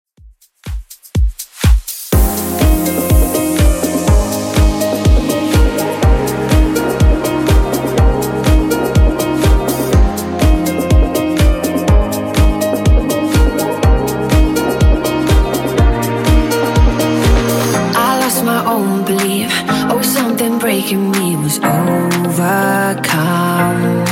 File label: Top40 Redrum 123bpm